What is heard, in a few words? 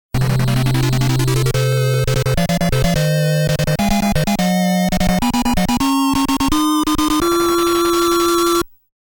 Opening Fanfare; Nekketsu Kouha Kunio-kun Ongakushuu